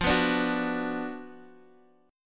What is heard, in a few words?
F sharp Major 7th Suspended 4th Sharp 5th, F, chord